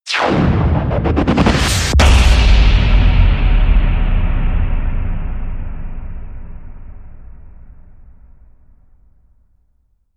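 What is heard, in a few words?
hit stutter; exhaustion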